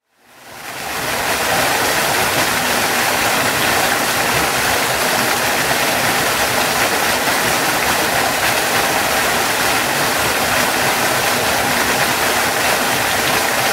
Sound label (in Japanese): 自然の音 滝の音